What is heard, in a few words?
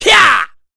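Vox Attack, Korean; voices; heroes; Ezekiel; kingsraid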